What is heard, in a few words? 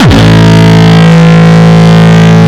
Longkick, kicks